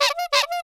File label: clown horn short, Cartoon Film Effects